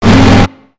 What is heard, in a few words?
Robot Air Flail; creatures mechanical; Lego